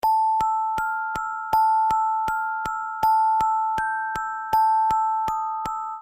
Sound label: musicbox